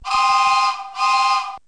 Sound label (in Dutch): Trein, Treinbaan Geluids Effecten